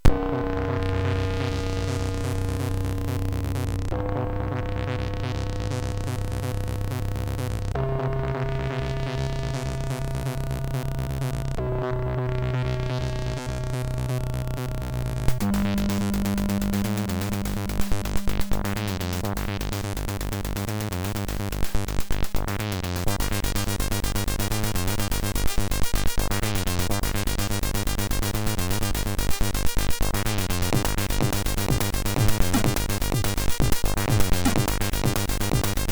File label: Brain Waves, MOS6581R3